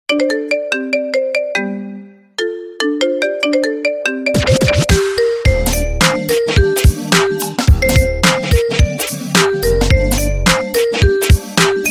marimba remix

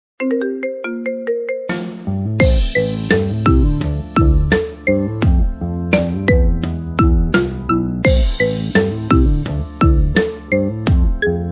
marimba remix marimba ringtone; suicide squad

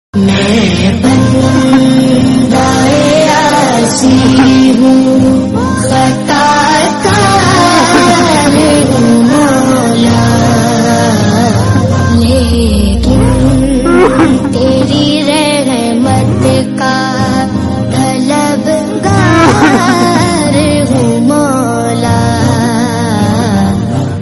Islamic Song

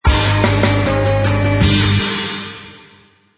sms; batman

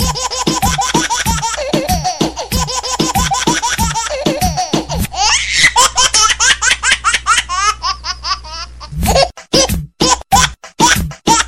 baby rap

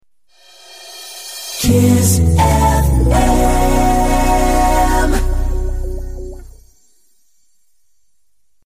jingle slow kiss

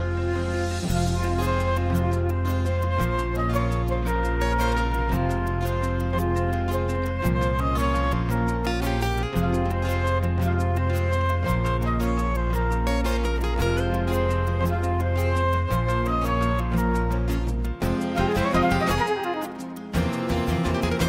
instrumental

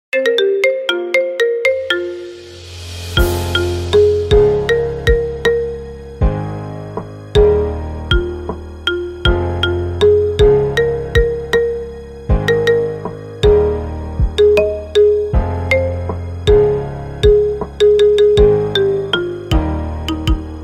marimba remix ringtone

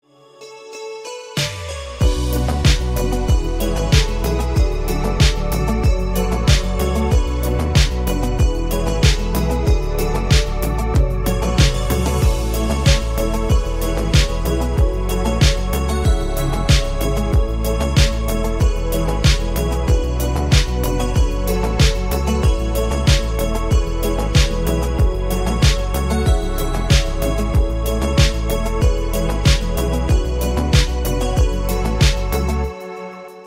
instrumental

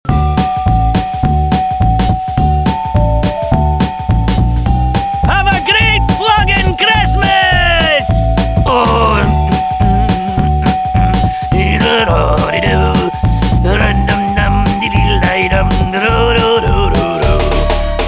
jingle bell version ringtone; bad santa

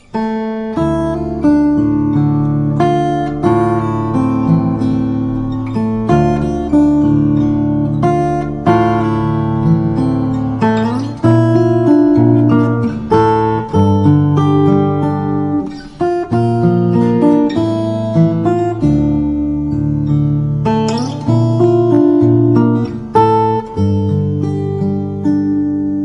guitar ringtone

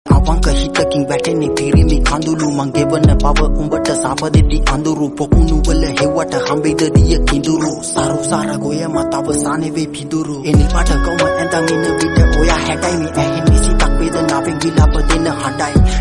Rap Sinhala

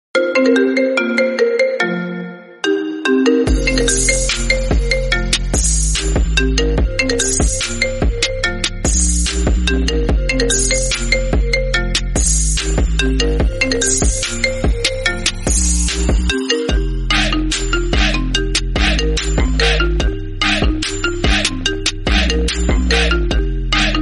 marimba trap remix

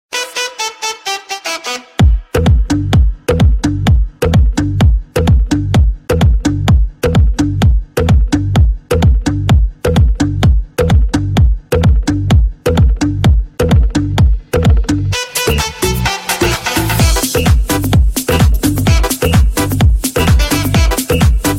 sax

